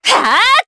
Vox Attack, jp; Yanne; kingsraid

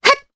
Vox Jump jp; voices heroes jp; Cleo; kingsraid

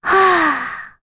Sigh, kr, voices; heroes; Rehartna; kings raid

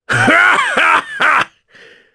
Laugh; Ricardo; jp; kingsraid

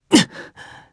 Vox Landing, jp; voices heroes jp; Arch; kingsraid

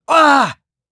Vox Damage; Siegfried; kingsraid

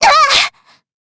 Damage, jp; Jane; kingsraid

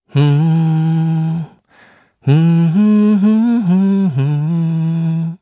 Vox Hum, jp; heroes; Zafir; kingsraid

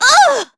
Vox Damage; Jane L; kingsraid; voices heroes

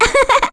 Vox Laugh; Lilia; kingsraid; voices heroes en

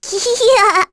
Laugh; Luna; kings raid; en

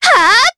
Vox Attack, Jp; Talisha; kings raid

